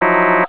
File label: beep